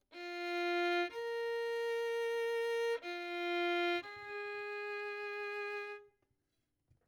Violin; Verdigris Music

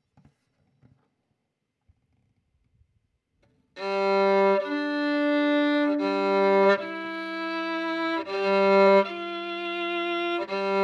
Violin

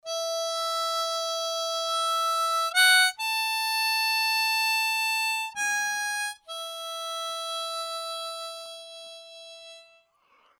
Harp Excerpt, Harmonica; Verdigris Music

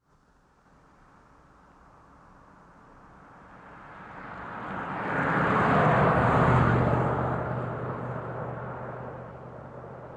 Cars Passing On Highway

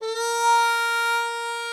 verdigris7 harp; backwards

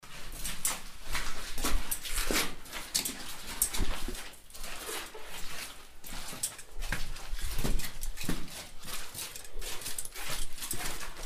walking sounds

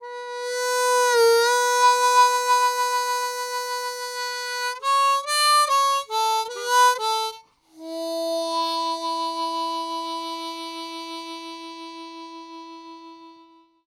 Harp Excerpt; Verdigris Music

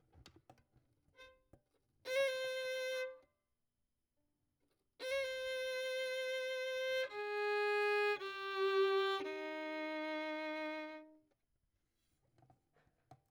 Violin; Verdigris Music

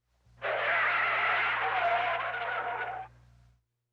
Tires Screeching

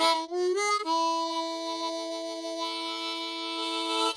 harp; Verdigris Music

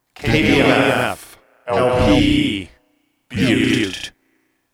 Ambient; STATION IDs

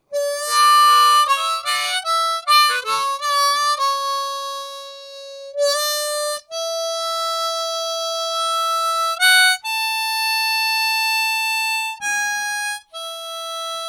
Harp Sample

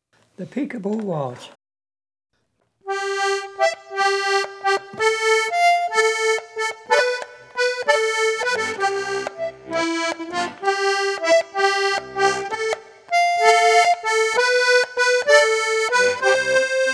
Waltz; NEWSHOUR

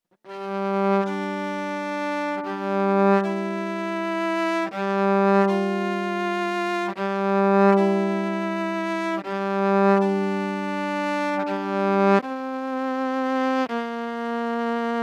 Violin; Verdigris Music

